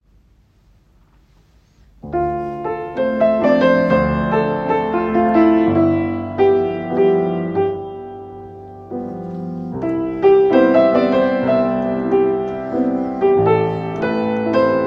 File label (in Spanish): Musica Adagio Grupo